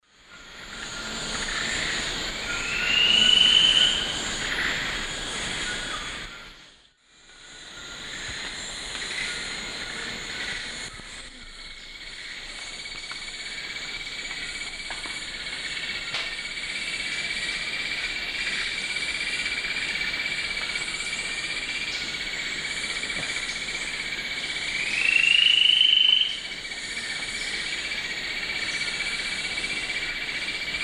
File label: Ancistrops strigilatus, duet; S. America; Eastern Lowlands